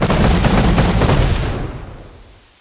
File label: BIG GUN; VERNE